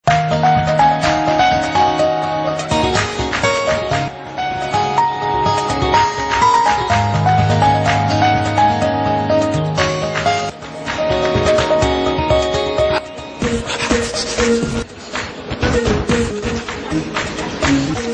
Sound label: Kabir Piya Tune